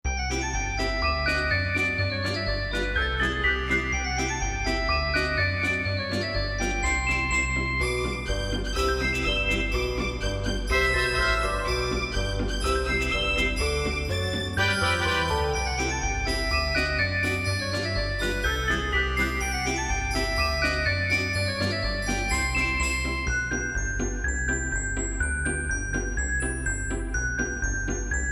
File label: cartoon unhurried